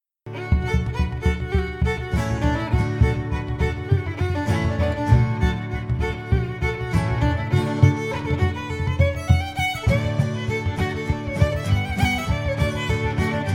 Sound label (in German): Instrumental Reel Geige Bodhrán Gitarre